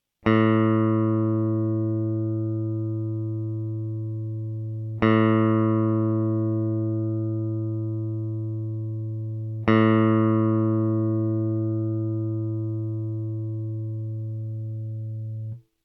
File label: open d